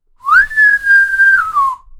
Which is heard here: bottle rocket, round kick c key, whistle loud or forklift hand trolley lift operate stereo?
whistle loud